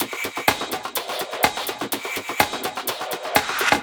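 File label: No bass drum, Drum Loops 125bpm, Nu Dance Electro; Zero-G; Soundclash Nu Dance Electro; Acidized Wavs; Groovin Noise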